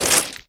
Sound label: fidget move